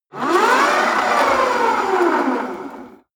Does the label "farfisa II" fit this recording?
No